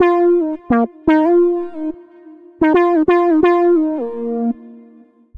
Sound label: Evening Casual Bb Minor 89 bpm; MIDI Clips; Tonal; Melodic; Ableton; Factory Packs; Chop and Swing